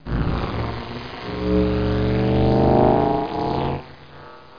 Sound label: plasma